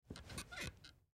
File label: Household Couch Sit Squeak Furniture; Living Room; Blastwave